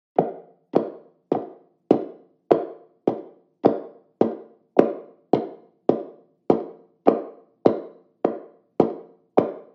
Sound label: Footsteps